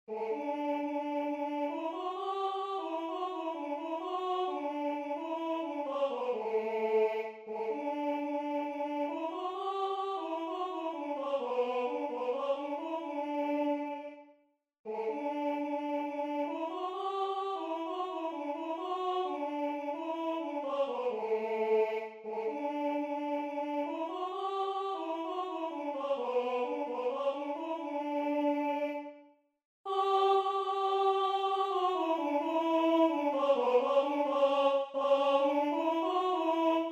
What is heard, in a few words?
tenor